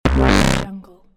failure sound

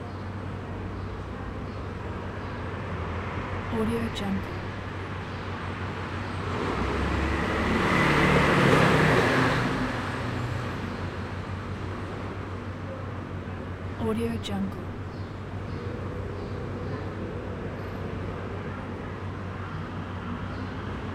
athens side street ambience

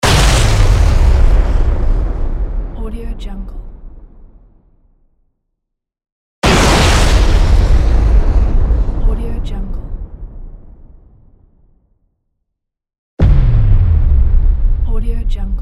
trailer explosion

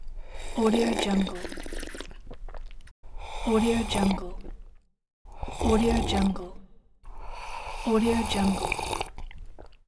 slurping

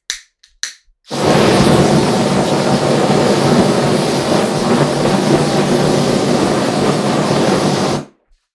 flame thrower

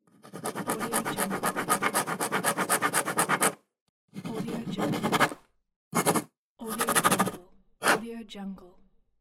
pen

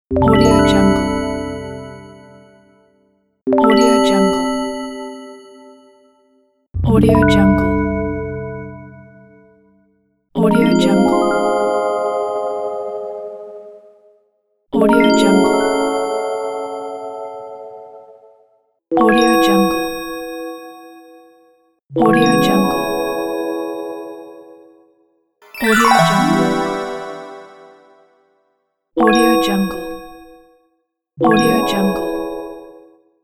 item acquiring

